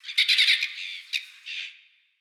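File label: ambience sea birds; War Thunder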